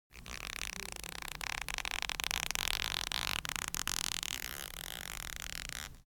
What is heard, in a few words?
cloth Stretch; spellbound